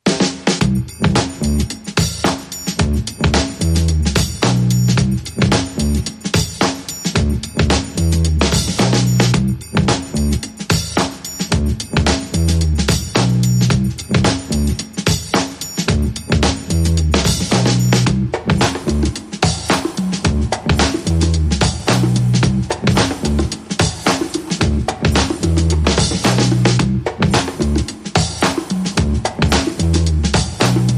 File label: Drums and Bass Mix